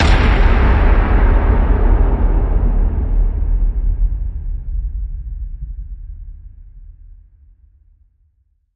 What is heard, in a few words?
Hit Big Breaking